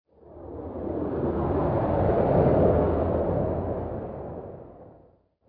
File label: amb tfly by; Star Wars DroidWorks